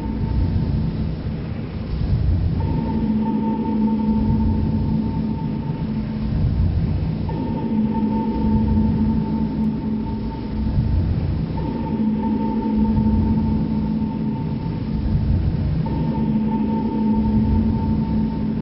hallway alarm loop; svencoop; rngremake

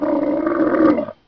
troop pain; svencoop; rngremake; npc; trooper

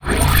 railgun windup; svencoop; rngremake

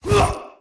fist draw; svencoop; rngremake

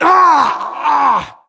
horseman wound crit; svencoop; keenhalloween; horsemen

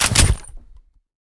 pistol draw; svencoop; rngremake

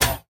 railgun empty, weapons; svencoop; rngremake

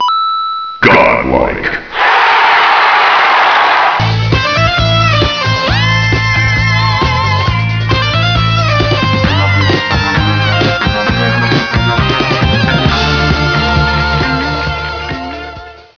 gold coin; svencoop

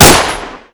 Fire Both, weapons, Skorpion; svencoop

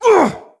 horseman wound; svencoop; keenhalloween; horsemen

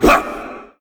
fist voice; svencoop; rngremake

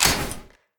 shotgun empty; svencoop; rngremake

